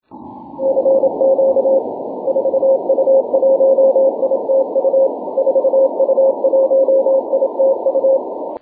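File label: 6m continuous wave; 4u1itu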